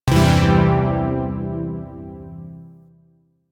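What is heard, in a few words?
Shawzin Major Chord; Zariman Shawzin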